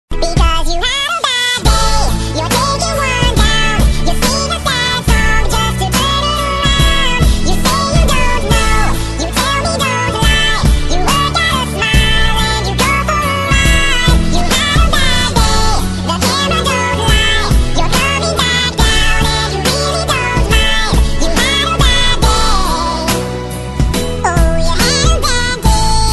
Fun Tones Ringtone

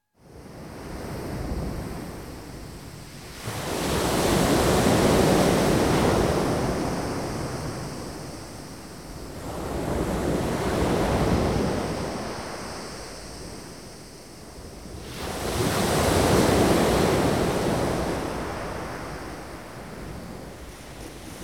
Waves On a South American Beach; Dr. Sound Effects; 210 Nature Sounds - 20 Hours of Relaxing Natural Ambiences for Meditation and Sleep

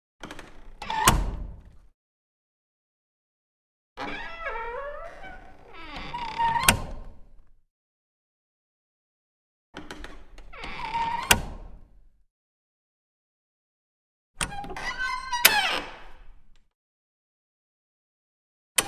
Small Wooden Chamber Door With; Hollywood Edge Historical Series; cd4 Castles Palaces & Monasteries

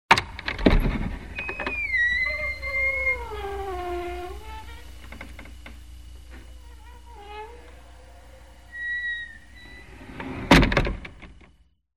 Squeaky door opens and closes; SOUND EFFECTS1

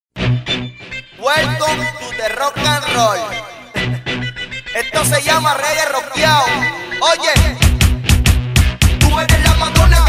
Reggae

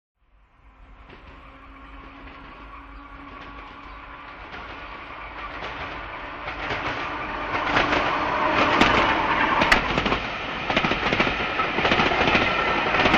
Train By (Medium) Electric Train Medium By; Hollywood Edge 3-D SFx

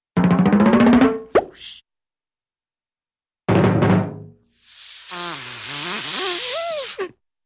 Drum Roll; Hollywood Edge Cartoon Trax

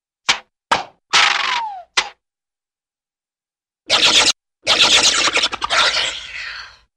Funny Engine Pop & Sputter; Hollywood Edge Cartoon Trax

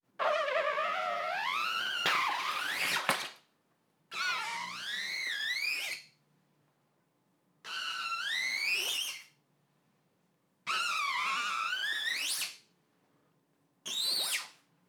Scrape Shower Curtain with Scissors; Foley; Props; Foley Essentials

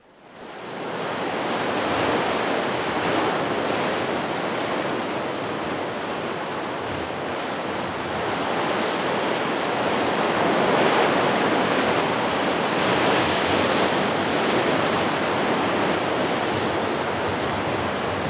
Surf Crashing On the Rocks; Dr. Sound Effects; 210 Nature Sounds - 20 Hours of Relaxing Natural Ambiences for Meditation and Sleep

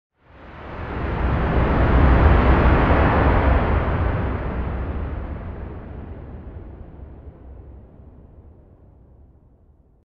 Water - Lowered and processed big ocean wave by; Hollywood Edge Sound Designers Tool Kit

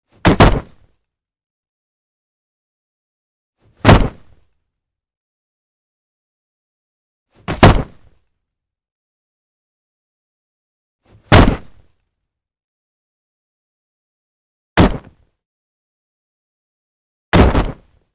Body Slam Fall, Martial Arts and Human Impacts; Hollywood Edge Martial Arts and Human Impacts

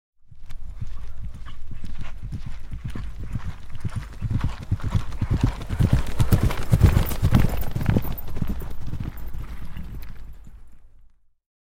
Single Horse Canter By; Historical; Hollywood Edge Historical Series; War Horses Armies & Weapons

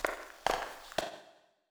concrete, footsteps; 1000fx

